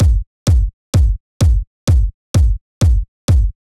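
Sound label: Drum Loop 128BPM bass drum; VEC5; Drumloops; Multi Loops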